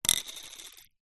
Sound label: lesiure toy ballbearing plastic maze set down on wood surface